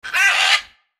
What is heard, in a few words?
Ara